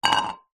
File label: household cup metal camping set down wood counter